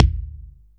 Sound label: Kick, Akai MPC-500; Toolkits; Kick TKit